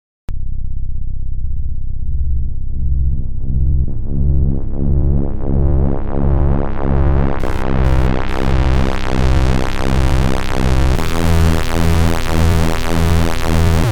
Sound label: step crunch, polyend tracker chiptune